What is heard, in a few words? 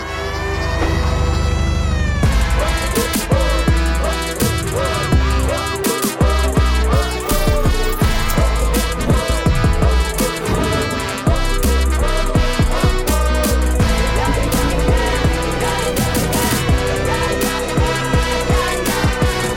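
background music ringtone; KASARGOLD MOTION POSTER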